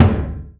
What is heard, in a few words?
AFRO DUNDN, Heart of Africa; Spectrasonics; Heart of Africa 1